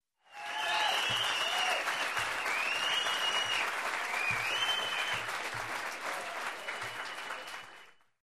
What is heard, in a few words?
Close Room Applause and Whistles